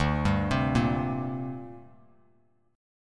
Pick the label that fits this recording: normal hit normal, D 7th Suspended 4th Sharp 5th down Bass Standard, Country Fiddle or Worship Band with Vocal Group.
D 7th Suspended 4th Sharp 5th down Bass Standard